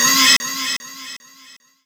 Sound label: scare; slender